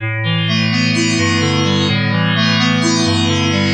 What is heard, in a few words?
Chemical Bells